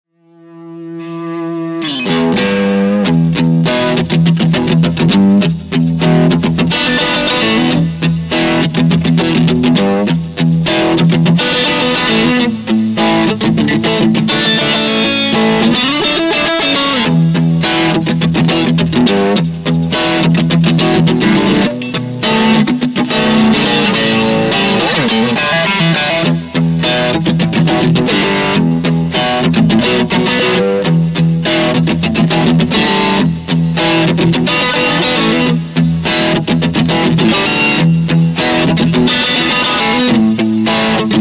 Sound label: vintage; Vox Tonelab LE; icon v6